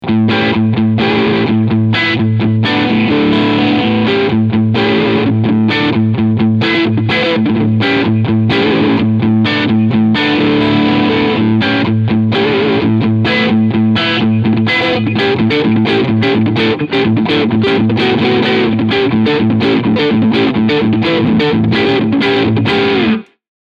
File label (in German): Crunch beide PUs